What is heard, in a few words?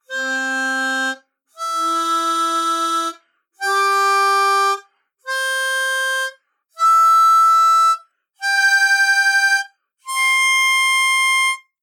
Blow Octaves, harp; Neuma